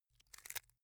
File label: bamboo small break soft